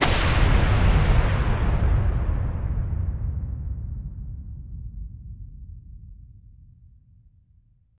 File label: Missile Launch Low